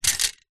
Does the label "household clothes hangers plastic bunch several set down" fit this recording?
Yes